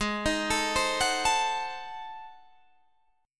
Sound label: Ab 7th Flat 9th Guitar Open D, chord